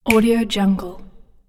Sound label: finger snap